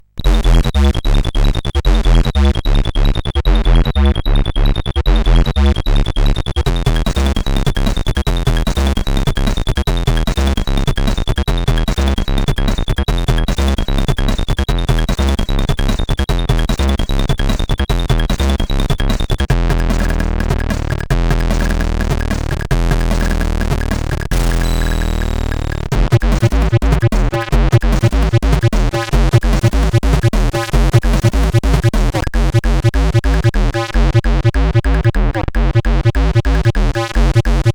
Crash, CSG8580R5

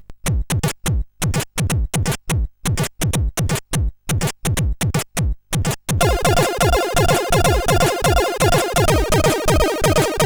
SID Jig, CSG8580R5

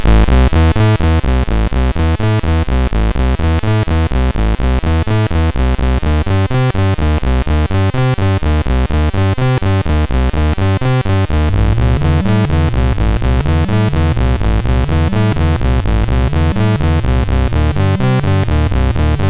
Infernal 25Hz, MOS6581R2; sid

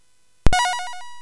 Space Gun

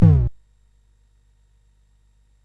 Dark Castle, CSG8580R5

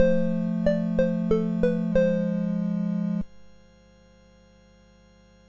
MOS6581R4, GAMES; Windwalker

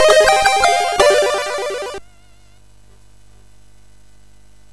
Jingles, MOS6581R4 sid

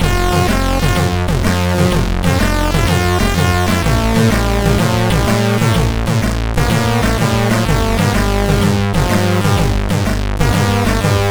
Beaty intro, sid MOS6581R3

